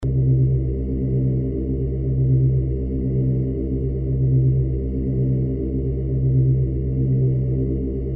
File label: hum